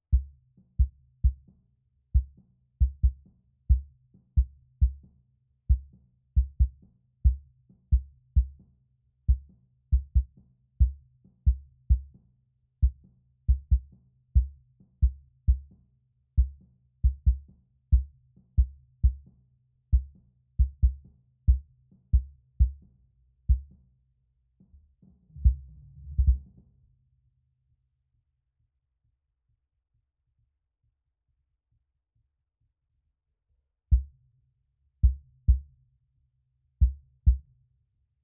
Sub

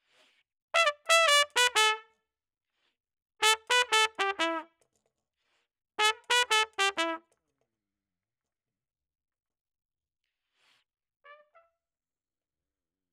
Trumpet

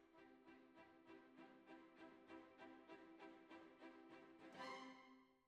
Flute

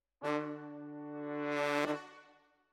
Horns

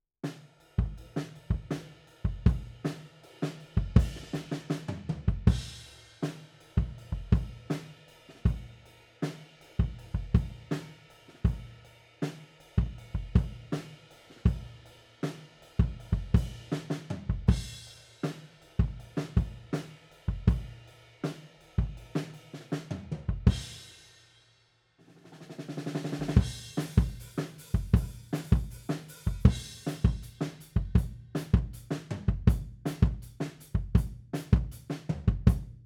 Kick Out, 160 BPM

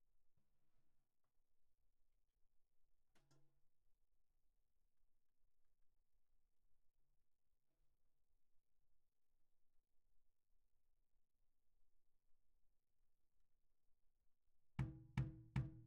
Snare Bottom, 160 BPM

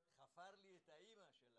SNR TOP

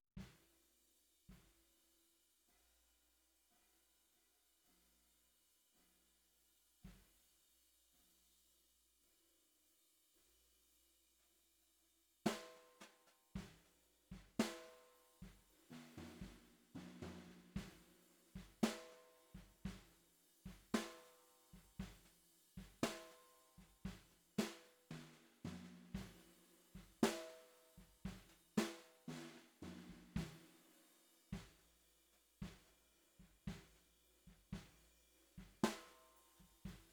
SNR Bot

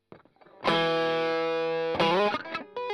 Strum Line

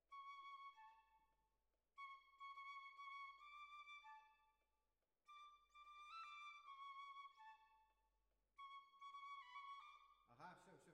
Violas Over

